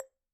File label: Bottle Struck